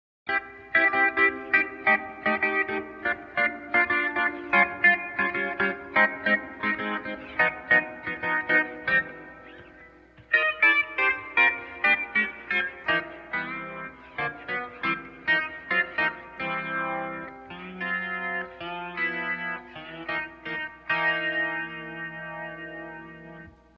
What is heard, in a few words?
Factory Settings Pads, Neo Ventilator